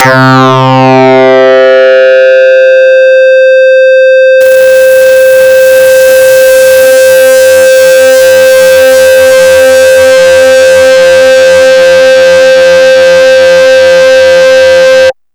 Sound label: DIST STACK; Big Beat Samples & More; AKAI